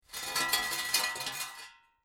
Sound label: Metal Rattle Grind Short, Metal drag sound, scraping a metal pip; scraping a metal pipe along dirt ground